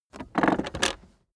car accessories armrest open soft; autos; verkehr transport

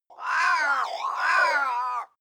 crows abstract; natur tiere; voegel

